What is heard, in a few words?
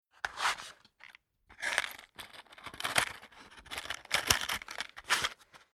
Fire Opening Match Box Removing Match Closing Box; Flame Sound Effects